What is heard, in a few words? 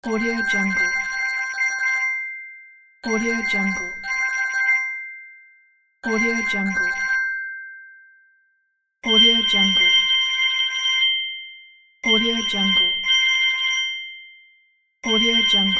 ringing phone bell pack